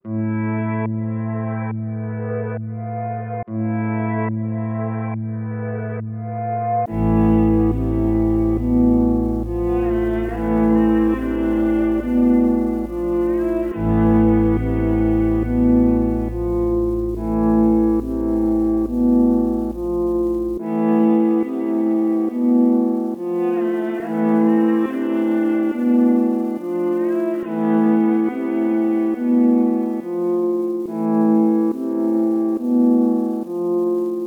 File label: 140 BPM A Min, Melody, Drill; Cymatics; 2022 Melody Collection